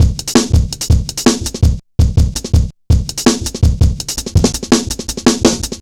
165, Drum Loops, Drum & Bass; Total Drum&Bass

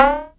SYNCUSSION

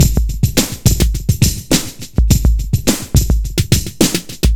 a short delay, 105bpm

